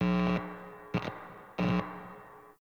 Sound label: DJ Booth Amp Plug In; Warframe; Nineteen Ninety Nine; Hub; Hub Pub